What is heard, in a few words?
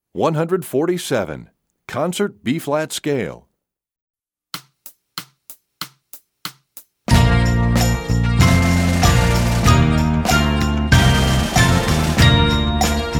Concert Bb, Percussion; EE2000 Book1